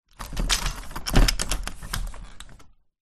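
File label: Shutter Close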